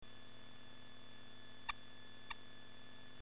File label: radar doppler